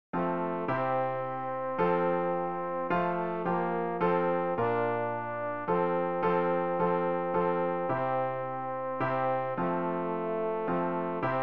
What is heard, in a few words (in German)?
tenor; Ref. Gesangbuch; RG 585: Gedenke unser